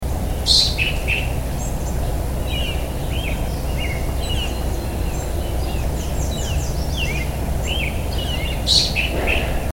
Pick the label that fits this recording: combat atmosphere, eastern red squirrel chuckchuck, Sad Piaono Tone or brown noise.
eastern red squirrel chuckchuck